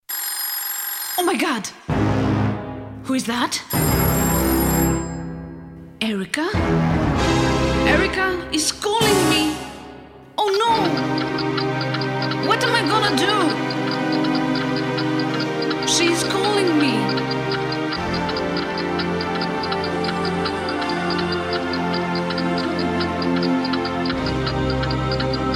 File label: suspense